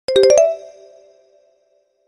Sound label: Collect Note; Banjo-Kazooie's Great Adventure 2; Banjo-Tooie